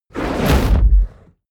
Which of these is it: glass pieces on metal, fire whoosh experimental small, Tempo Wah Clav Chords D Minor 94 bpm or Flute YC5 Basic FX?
fire whoosh experimental small